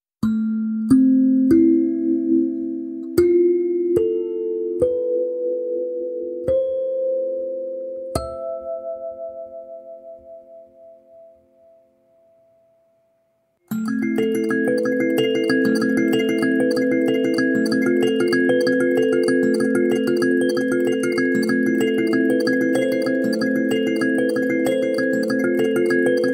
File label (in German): Sansula Deluxe A moll Klangbeispiel; Hokema